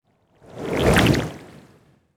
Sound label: Move Water; Tsugi